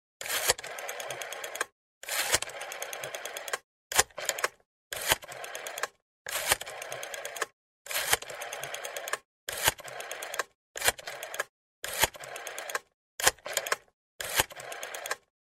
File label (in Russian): Zvonok